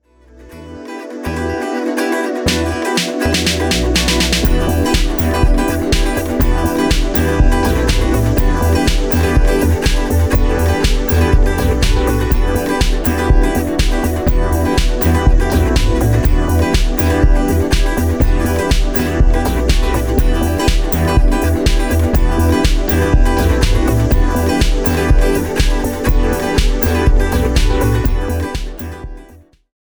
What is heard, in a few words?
122bpm remix